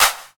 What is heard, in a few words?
clap d sharp key, Royality free hand clap sample tuned to the D# note. Loudest frequency: 4817Hz; percussion; single hits one shots